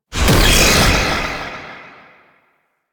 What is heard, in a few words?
Teleport Out; Warframe; The New War; Archon; Boreal; Abilities